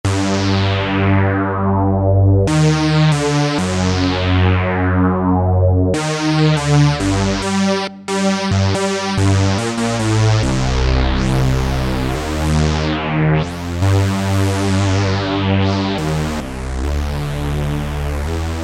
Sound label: Bass Big Bass Pedal, Alesis Micron; Factory Programs